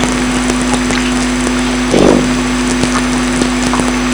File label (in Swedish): grymtning; Vattern; expeditioner norra Vattern